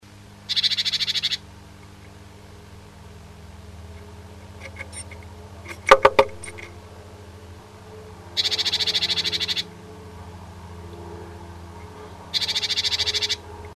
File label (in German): nestbau rufe, nistkasten